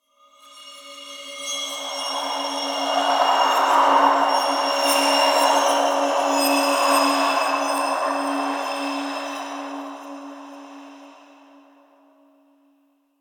Interior Cave Spirit Gust, Ambience; Warframe; Duviri

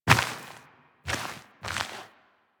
Steps; Umbra; Warframe; Sacrifice; Sacrifice Shrine Intro

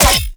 Full Draw Mech; Warframe; Infested; Derelict Cernos Prime

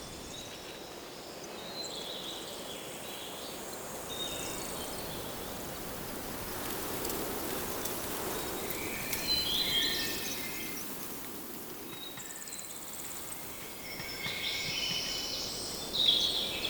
Forest Open Glade Loop, Ambience, Day

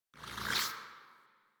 Weapon Show

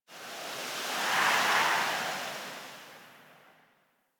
Eidolon Wind Gust Small, Ambience Plains; Warframe

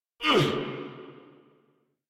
Pain, Grineer Male

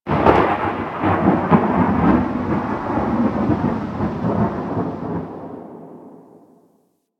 Exterior Thunder Claps; Props; Warframe; Railjack

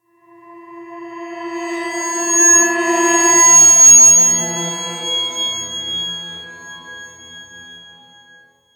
Derelict Creepy One Off, Ambience, Interior; Warframe; Orokin Derelict

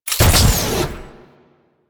Mech Jump Boost Start; Nechro

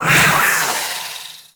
Territorial Agression Shout; Pet Mods

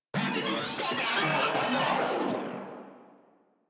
Vocal Death, Enemies; Warframe; Moa

